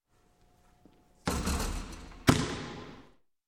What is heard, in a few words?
Basketball Sink Basket; Sound Ideas 2000 Series